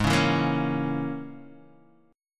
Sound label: Bb Minor 7th Flat 5th, guitar